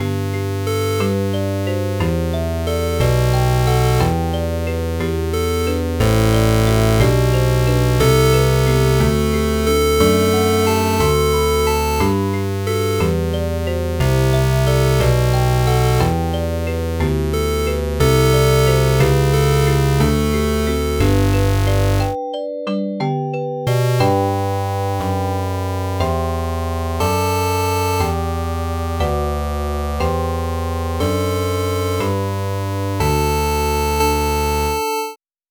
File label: crystal